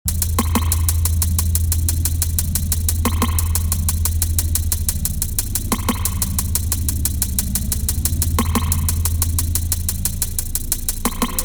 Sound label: Beat